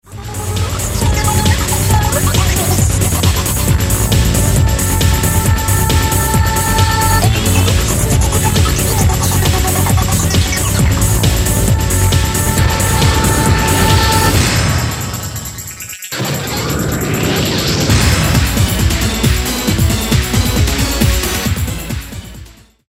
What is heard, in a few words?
distort